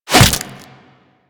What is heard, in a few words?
Desert Skate Barb Hit Default B; Warframe; Attacks; Hit Effects